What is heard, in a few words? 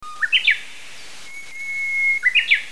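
Japanese bush warbler; uguisu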